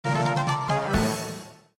collectibles 1up; Adventure Time - Break The Worm; ThrillGames

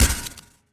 star award; Ben 10 - Escape Route

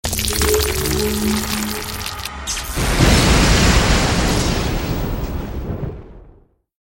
boss lich die; Adventure Time - Break The Worm; ThrillGames

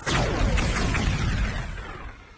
object destroyed; The Powerpuff Girls - Trail Blazer; NextlevelGames

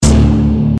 backing track chunk; Adventure Time - Elemental; ThrillGames

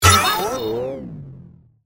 fail; Max Steel - Match And Destroy

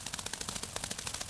flap; stinkfly; Ben 10 - Omnicode

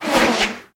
dash; Power Rangers vs Teenage Mutant Ninja Turtles - Ultimate Hero Clash 2

